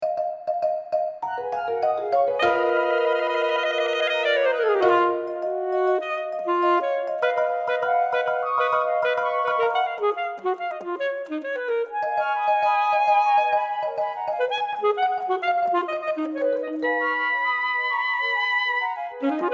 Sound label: Sparkling Fantasy